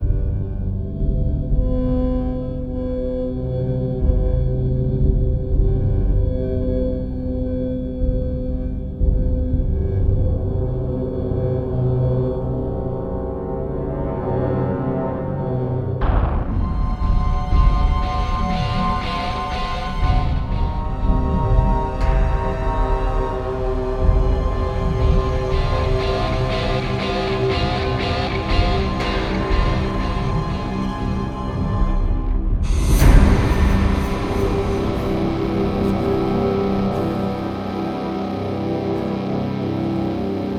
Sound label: Fight Theme Ambient; crysis 2; ps3; xbox 360